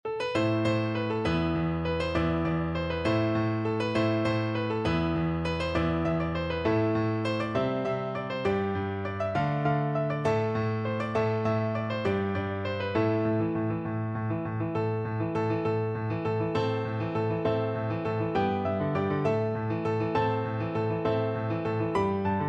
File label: pirates, piano duet